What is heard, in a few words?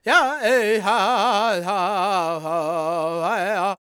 G# MIN, World Voices, LAKOTA